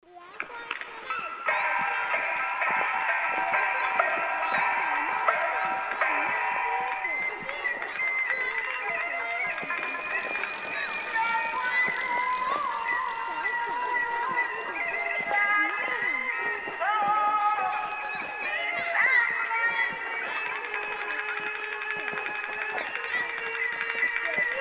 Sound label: beijing street